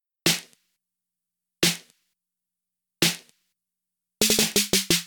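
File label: Music Maker Snare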